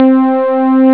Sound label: voice; puznic; Amiga Soundtracker Sample Packs